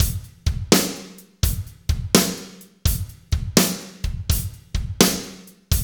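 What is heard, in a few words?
slow shuffle, Roland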